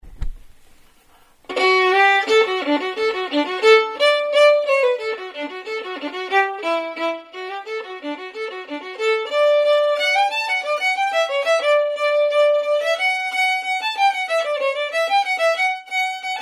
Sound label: Soldiers Joy Melody fast, violin; duets for violin only